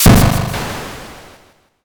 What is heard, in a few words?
thunder magic